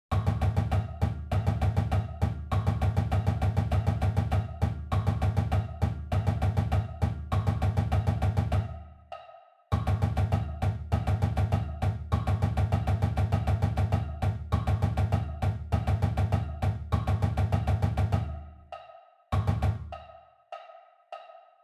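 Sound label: check patterns, drum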